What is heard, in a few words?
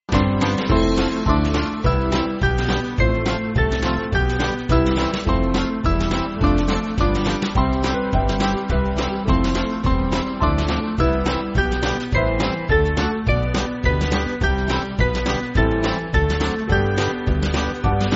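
Band, Backing Files for Churches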